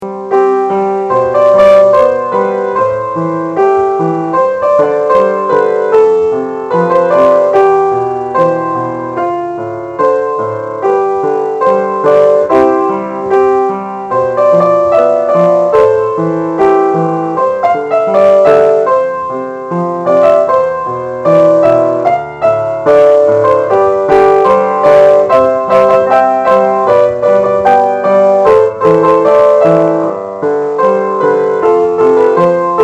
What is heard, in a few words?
teamsong ringtone org